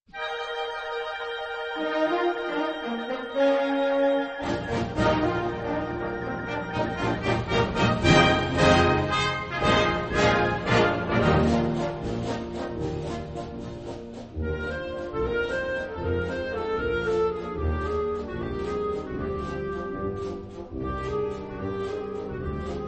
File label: bell